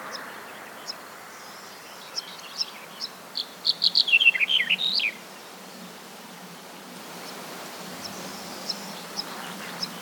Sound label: Black headed Bunting Emberiza melanocephala